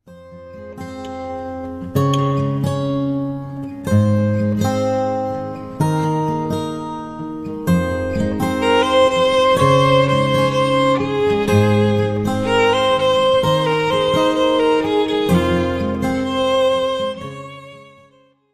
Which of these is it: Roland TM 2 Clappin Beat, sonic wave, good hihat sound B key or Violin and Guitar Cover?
Violin and Guitar Cover